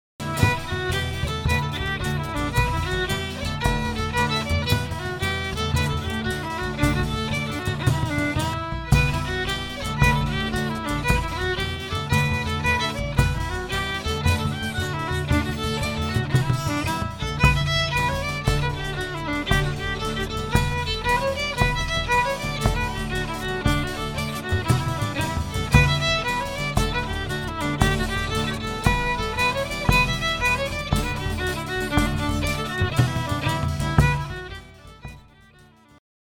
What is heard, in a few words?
JIGS; Condon s Frolic